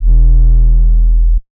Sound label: SUB ONE SHOT E; KULTURE